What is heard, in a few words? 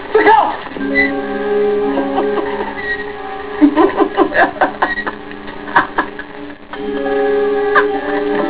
Laugh